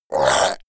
zombie grunt choke male short; kreaturen

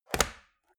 metal box small close hard; maschinen; soundpool

